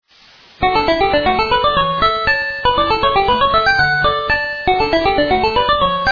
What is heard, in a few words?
Pizzicato Polka